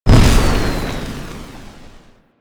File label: Flamethrower Fire; Warframe; Weapons; Lunar NY Ignis Skin